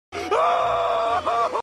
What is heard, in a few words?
screaming; spongebob; patrick; green screen; audio meme